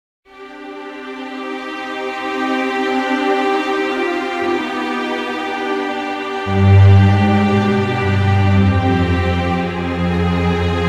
requiem loop

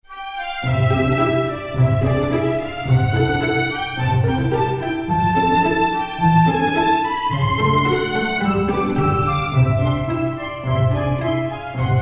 Loop

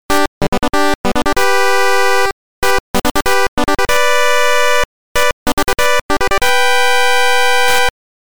8bit fanfare

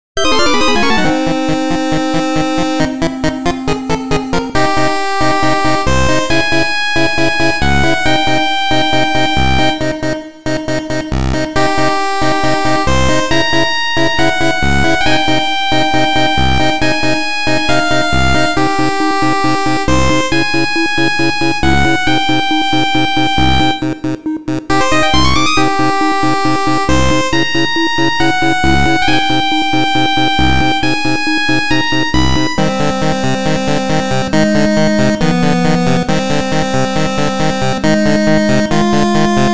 8bit sentou surround Loop

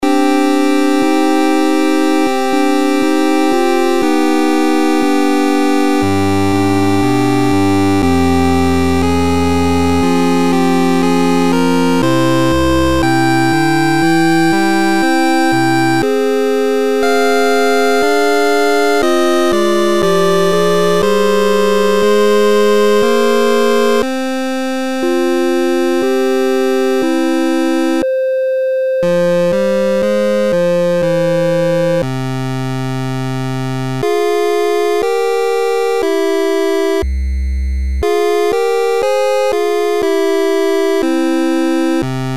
8bit requiem

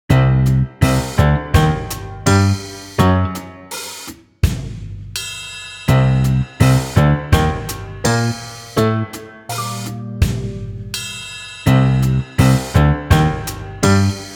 spy Loop

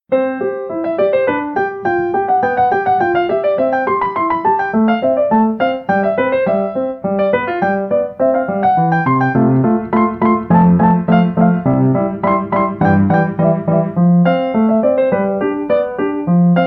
sonatine

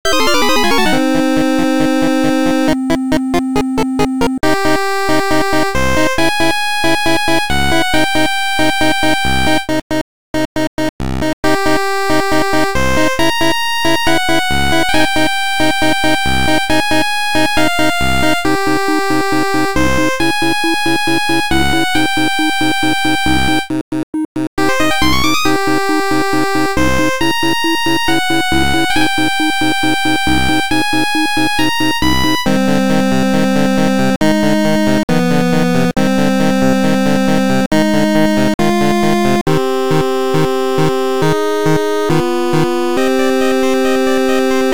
8bit sentou Loop

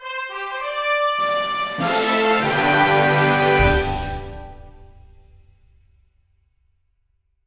fanfare

